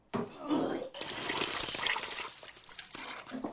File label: vomit